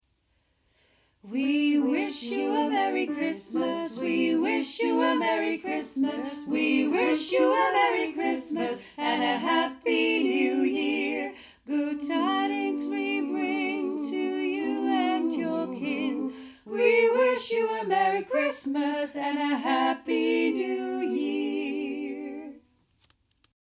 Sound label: we wish you a merry christmas